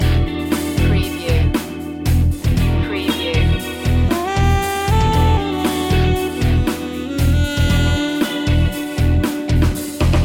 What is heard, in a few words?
rock fusion loop